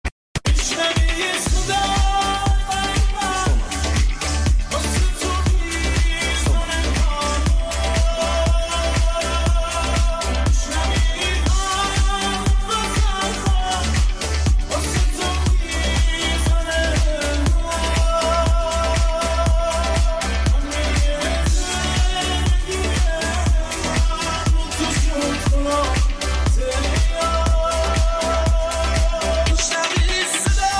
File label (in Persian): gitar